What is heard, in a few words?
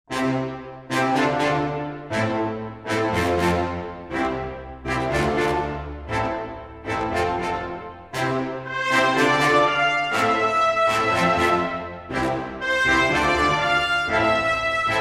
Instrumental